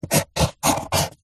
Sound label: office pencil paper hollow table draw square; zapsplat